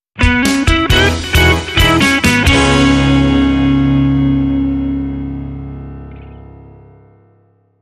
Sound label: 70s Rock